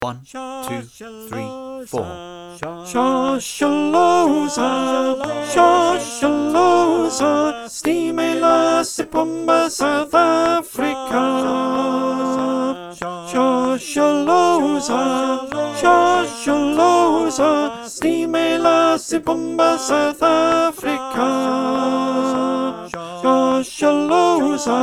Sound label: Shosholoza - Alt - click